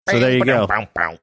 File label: mimicking bass transitions; seinfeld